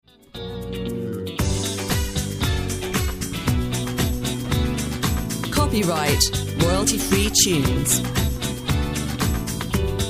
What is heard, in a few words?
Disco Diva